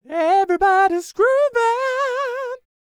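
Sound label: falsetto, R&B Dance; Spectrasonics Vocal Planet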